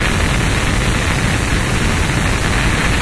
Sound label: tv static loop